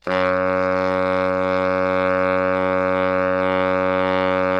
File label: BARI PP F#1, Sax; Giga Samples Collection; BARITONE PP